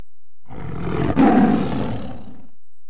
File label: TIGER; Webster's Millenium 2001